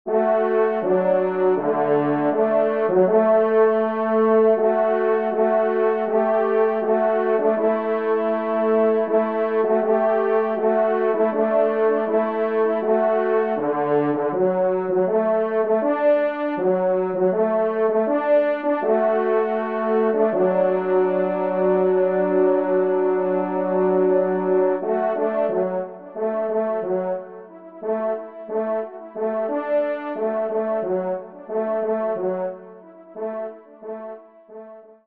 polka, trompe; Polka des joyeux piqueurs

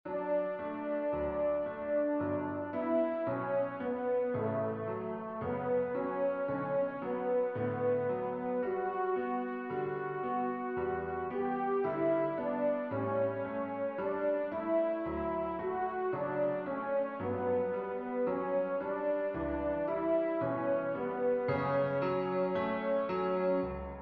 Pavane, Trp Pia; Pavane pour une Infante defunte; teknia

